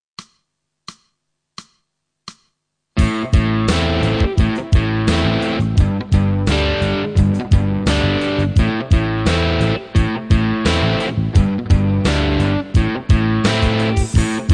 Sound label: Beat and Hit, Rhythm, Rock Metal; The Rock Metal Book - Wim Kueter